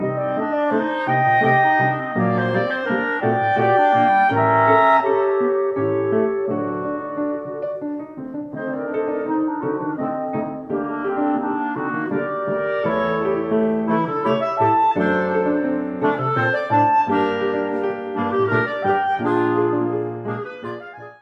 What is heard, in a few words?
Trio